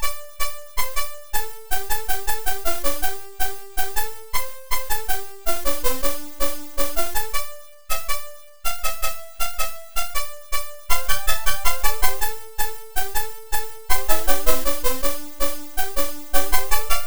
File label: fret noise; ludum dare 40